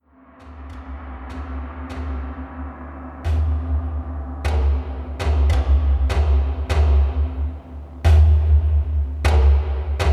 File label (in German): Entspannungszeit Schamanische Meditation